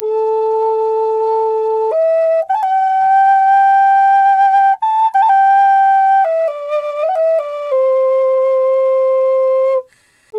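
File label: flute, 93 BPM; Big Fish Audio - Didgeridoo and Other Primitive Instruments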